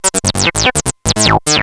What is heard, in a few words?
ring